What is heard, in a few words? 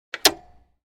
Motorcycle footrest moving up; vehicle